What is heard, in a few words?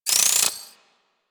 Credit Pickup Pile Medium; UI; Pickups; Warframe